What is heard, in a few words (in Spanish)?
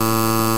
error cliente